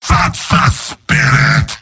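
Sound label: positive vocalization, male; demoman; tf2; garrysmod; mvm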